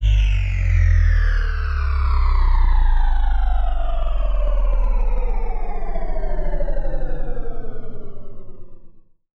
decelerating sci-fi drone

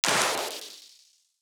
Sci-fi Energy Gun Blast

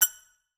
Synthetic Clang

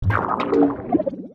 Sci-fi Transition

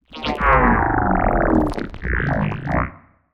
Sci-fi Organic Vroom

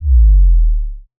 Clean Bass Drop

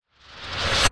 Swooshy Approach

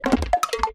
cartoon rattle

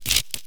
Buzzing Power Glitch

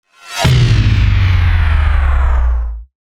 Approach Bass Drop

